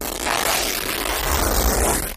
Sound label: Canvas Rip Medium; paper mvmt